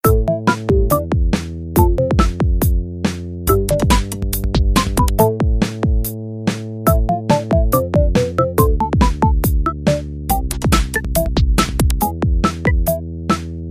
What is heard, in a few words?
soundtrack